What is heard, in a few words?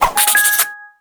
Character Attack